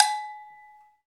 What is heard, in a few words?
AGOGO; Club-50 - Foundations Roland; CowBel+Metl; Agogos